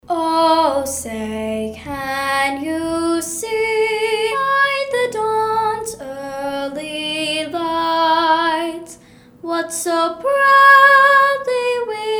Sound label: National Anthem